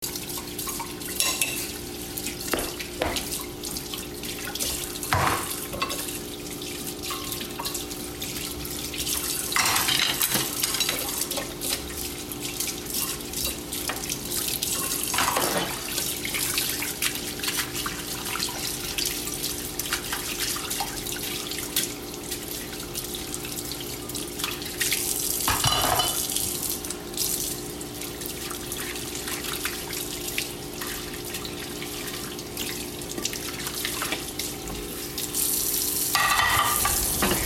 Dish Washing and the Outdoors

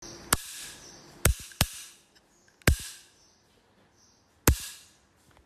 Ice Cream Scooper

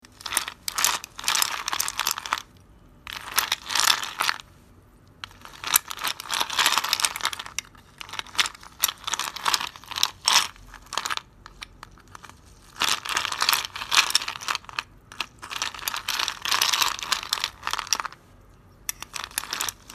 Ice Cube

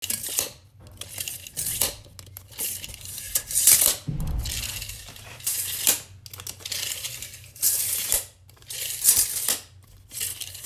measuring tape

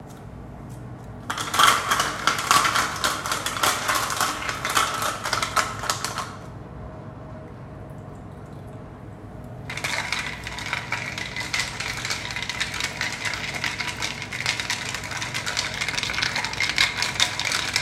Ice Melting

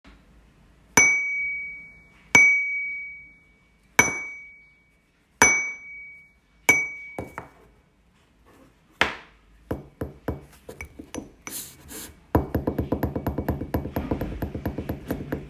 Tea cup

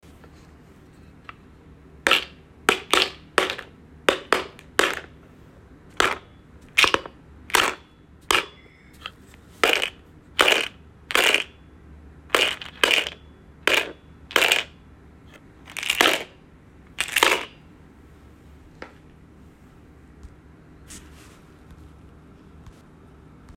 Case and pill bottle

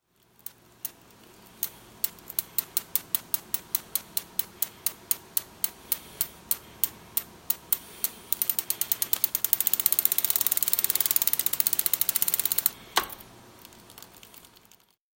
Bike wheels

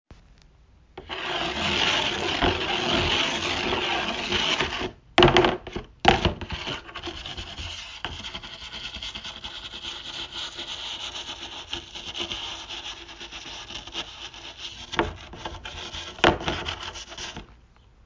Toothbrush

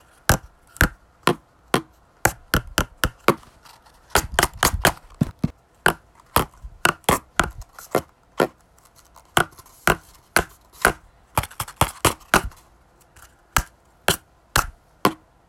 Symphony

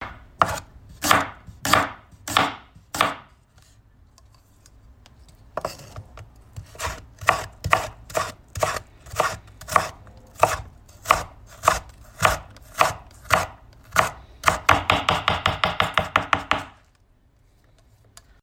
Knife

